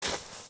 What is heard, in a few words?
FS Snow, footsteps